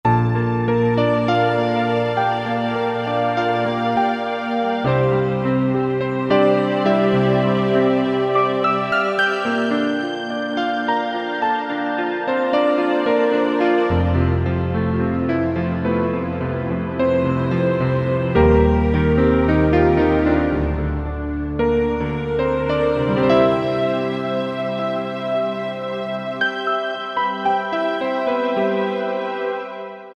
Soft Piano Strings; Optical Media International Sonic Images Library

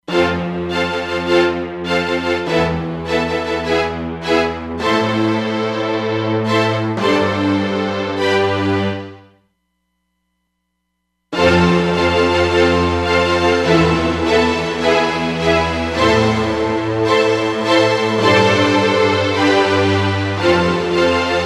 Chorus + Long Hall, Roland DEP-5; Factory Patches

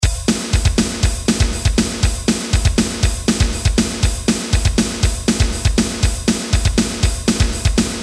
METAL1, Roland R-8

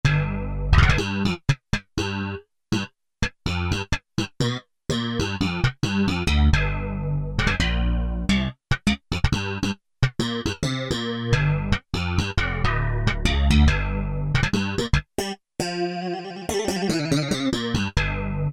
FlangingSlap, Roland U-220; Factory Patches

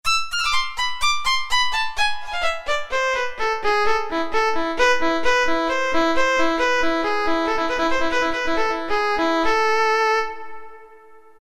Violin, Roland MT-32

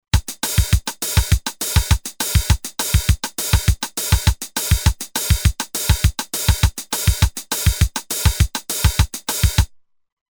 Rock Bossa, Roland TR-505; Factory Rhythms

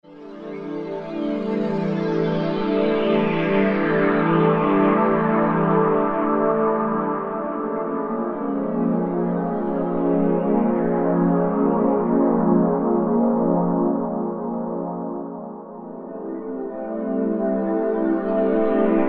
SYNTH TEXTURES - Ricochet; Sandoway Ricochet; Apple GarageBand 2

